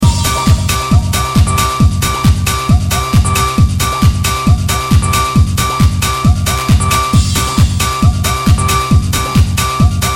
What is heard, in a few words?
Club Mix